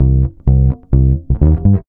REAL BASS, BASSLICKS; Ueberschall; Jam Box

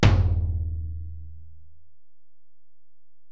Tom, Alesis DM-PRO

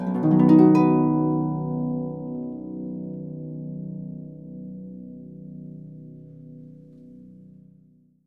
Percussion & Harp; Miroslav Vitous

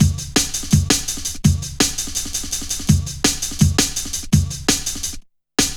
Fat Rolla, Jungle Drum Loops 166.5

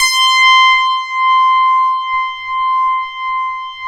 MATRIX 12, OB Synths, Analog; E-MU Formula 4000 Series Vol. 3; Analog Odyssey

